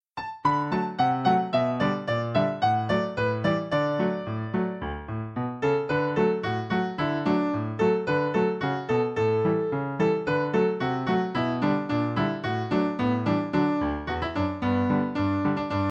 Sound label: get along home cindy instr; folk